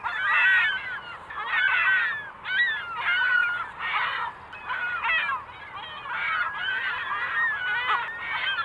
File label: bird; Mafie 1